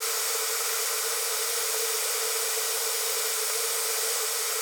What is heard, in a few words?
GAS ST, GAS STREA; Total Control - Action Cutz